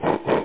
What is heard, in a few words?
WINCH